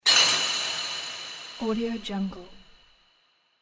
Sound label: dungeon metal hit